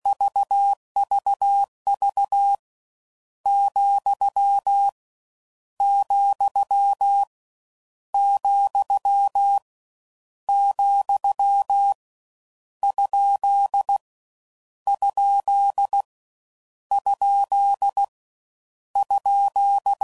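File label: Punctuation, CW Code Groups 15WPM; Morse Code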